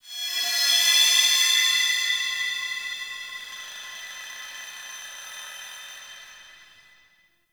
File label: Glassy Texture, Ambience; Warframe; Shadowgrapher